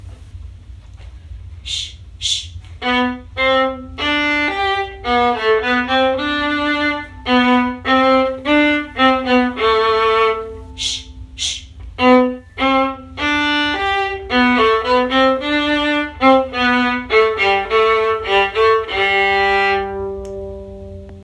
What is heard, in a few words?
Martini Gavotte Theme Viola; christmas concert practice clips